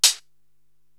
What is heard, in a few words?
HH Old Crisp Close, Korg TR-Rack drum machine